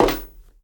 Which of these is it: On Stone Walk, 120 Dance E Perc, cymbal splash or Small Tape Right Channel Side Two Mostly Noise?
On Stone Walk